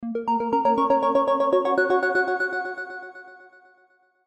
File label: Synth Arpeggio, Synth Arpeggio Ascend Happy; Musical; Synths; Blastwave